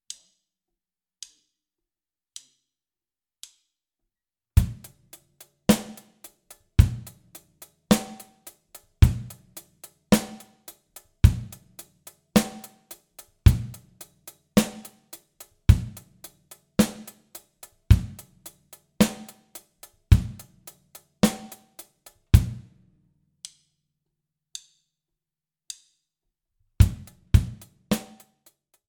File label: grooves